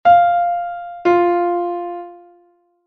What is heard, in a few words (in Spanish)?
8ª Justa Descendente; intervalos musicales; ¿Qué intervalo suena?